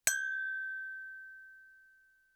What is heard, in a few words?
wine glass clink; casa